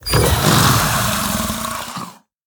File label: Bloated Python Play